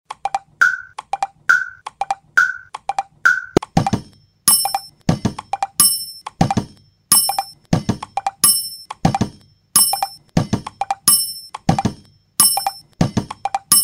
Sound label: soundscape